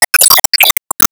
Team Skill Trigger

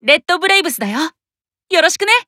Warcry

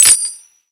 UI Coin Count Total

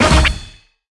Mission Notification; subway surfers city sound effects; Android, iOS

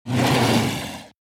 Boss Grunt Roar; The Binding of Isaac

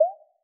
Move No Key

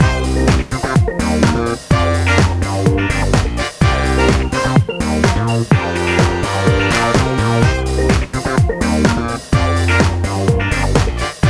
Disco Boucle; Imagine Modern Dancer; Nintendo DS

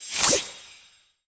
Login Daily Next

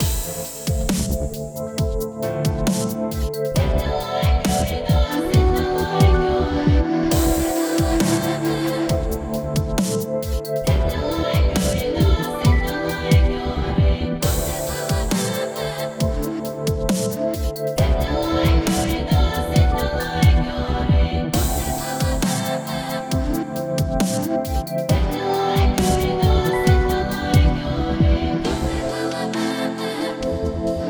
Dungeon; Eversoul; Android

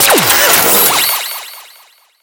Tech; Skylander

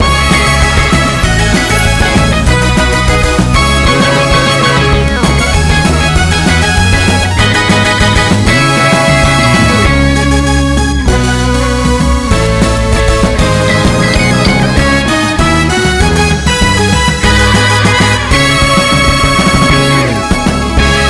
BGM Reach

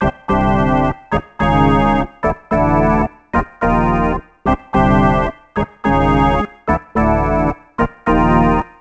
Ditty; NBA ShootOut 2001; PS1

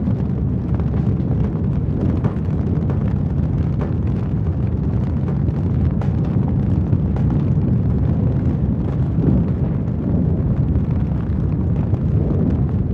fire; CrossroadsToHell fire

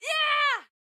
Spin; Jackie Spin Final

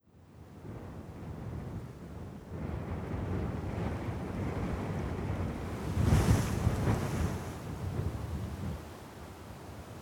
Intrepid potash Wind; Google Earth VR

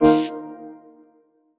Light All

